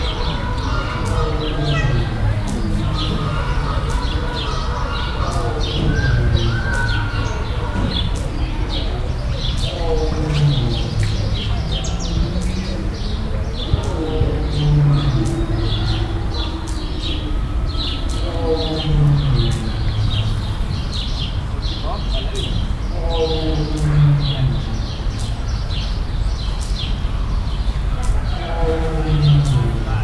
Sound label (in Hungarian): tavolbol, sziberiai tigris; ellenkezo iranyban ezo mikrofonok; debreceni zoo 2019